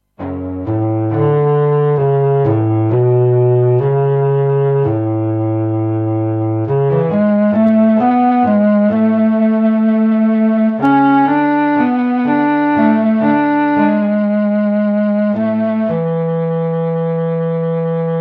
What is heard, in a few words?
Clarinet